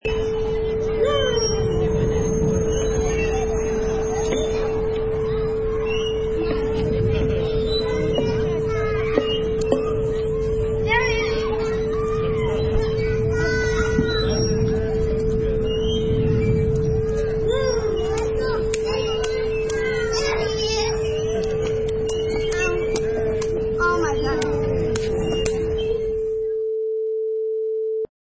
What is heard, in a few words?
Playground